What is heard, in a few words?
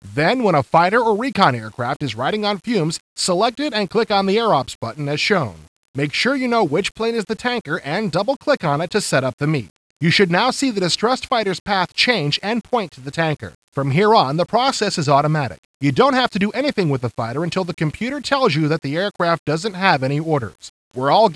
HARP